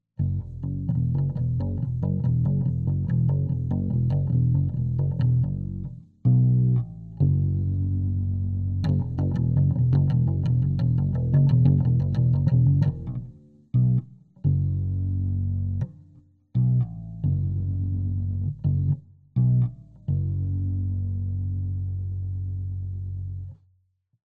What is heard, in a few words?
bass riff